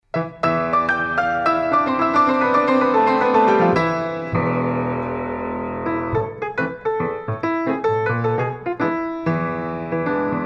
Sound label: milonga